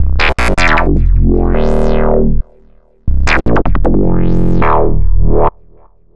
Synth Bass

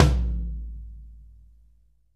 tom, drum

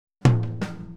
Boogaloo - Tom Rack, 82 BPM; Drums; Intro; Sonic Foundry; Drums from the Big Room The Mixes

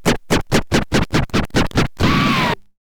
scratch kit, scratches; Masterbits; Vinyl Attack

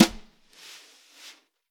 Vintage Snare Drum; Q Up Arts; Johnny.C's Vintage Snare Drum; 7.5X14

